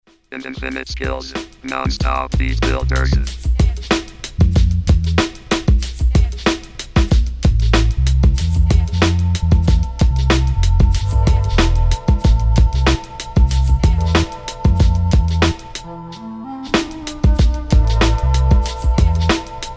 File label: Non Stop Beatbuilders